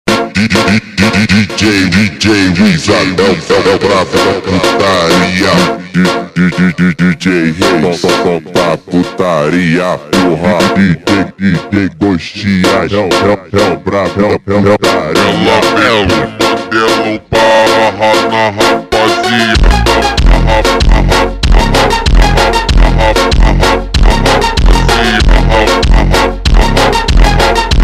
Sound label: funk